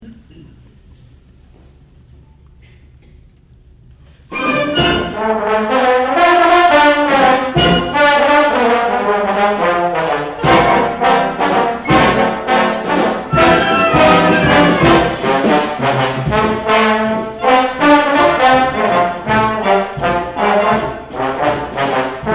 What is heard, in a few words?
Trombone Rag